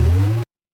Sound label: retro game power up